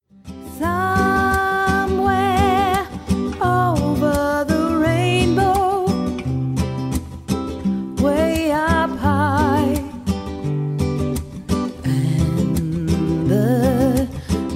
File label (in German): Saengerin